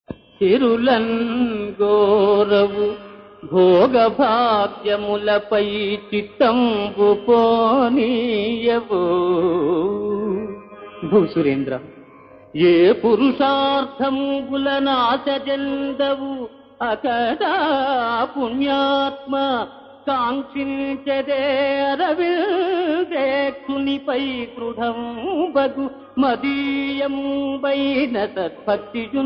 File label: Padyam; BhaktaAmbareesha(1959)